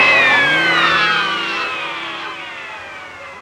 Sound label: Eerie Down, Ambient; Jungle; Zero-G Jungle Warfare 1, 2 & 3; 90 s Sample CDs